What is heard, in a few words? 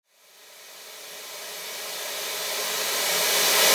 128BPM Reverse; Shockwave - Fx Elements vol 2